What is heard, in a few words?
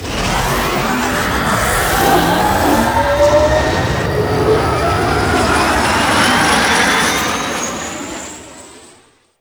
Extraction Arrival, Vehicles; Warframe; Liset; Liset Flight; Zariman Liset